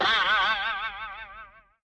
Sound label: vintage cartoon boink; Cartoon Sound Effects Kit